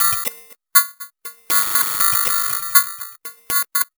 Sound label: Residual Beat; Sonic Foundry (Sony Creative Software); Loop Noir